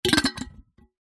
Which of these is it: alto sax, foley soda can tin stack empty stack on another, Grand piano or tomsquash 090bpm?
foley soda can tin stack empty stack on another